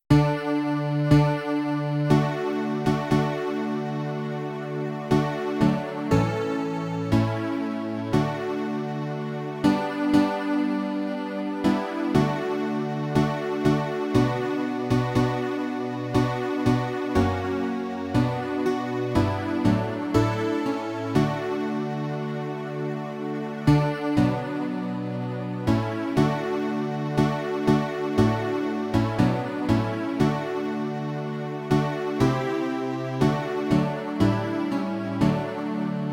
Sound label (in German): D Dur Atmosphere